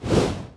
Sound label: Finalboss Prepare